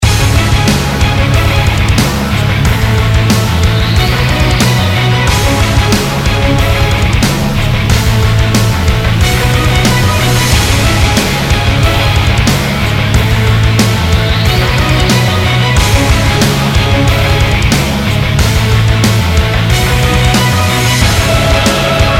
Raid Boss; Mist Train Girls

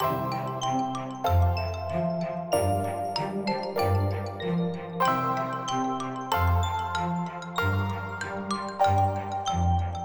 Loading Background; Toki Tori 2

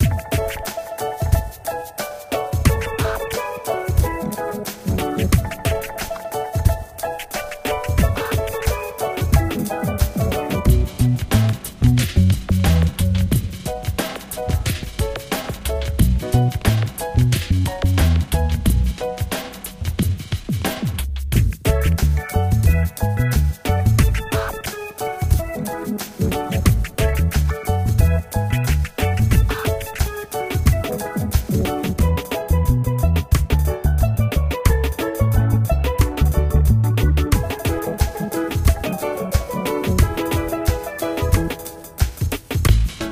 reggae

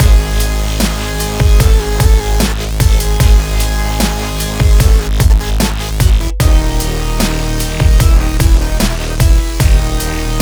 Start Menu; Odium; Odium To The Core gamerip 2018

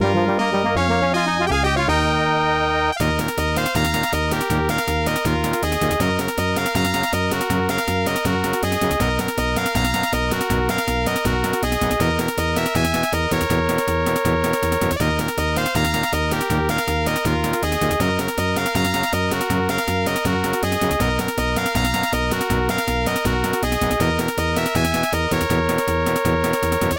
Victory; another star

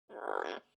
Macaw Idle action; Grow and go

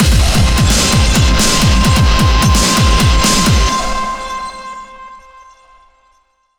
Action Boss; PunkGirl

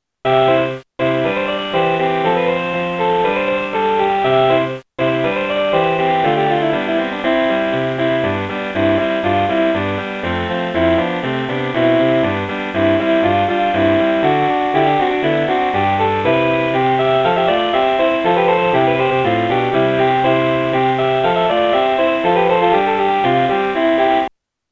Baroque; puyo puyo for macintosh; macos gamerip 1996

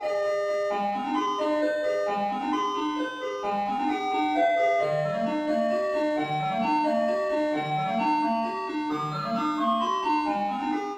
Ice Cream Truck

